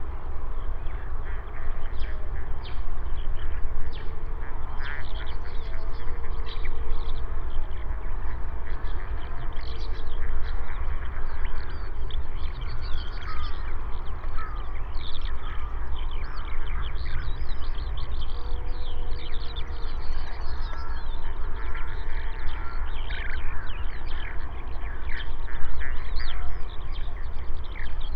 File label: Great bustard